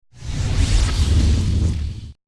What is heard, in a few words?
Ship Weapon Spinal Beam Fusion